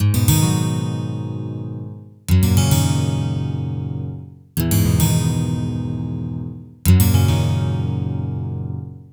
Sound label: 12 String Guitar